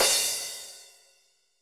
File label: cymbal, Roland MC-909